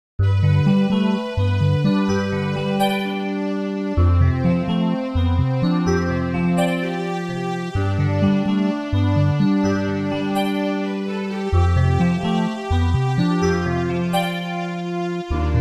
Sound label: In Water; SM64; Dire Dire Docks